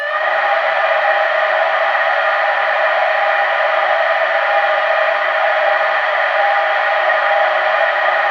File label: SHRIEK, Human; Distored Reality